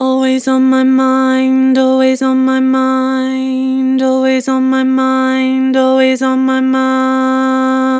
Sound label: Vibrato, Vocals; Harmor Vocals